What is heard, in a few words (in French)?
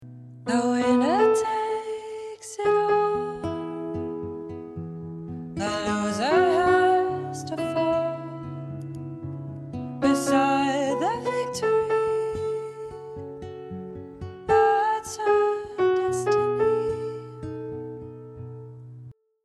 voix 3 guide vocal